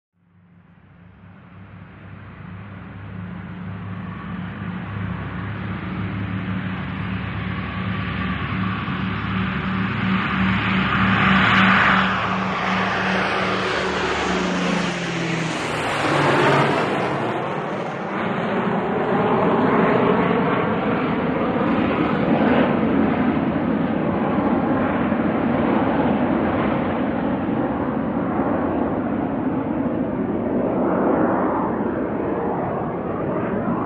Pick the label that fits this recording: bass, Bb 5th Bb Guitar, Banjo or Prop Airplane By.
Prop Airplane By